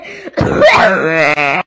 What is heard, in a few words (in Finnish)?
yskäsy keuhkot pihalle